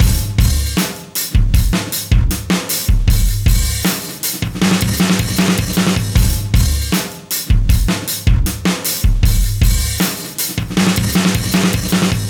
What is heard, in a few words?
78 live breaks